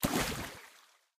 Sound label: bucket fill